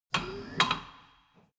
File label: construction garage tire changing machine turning platform; latch short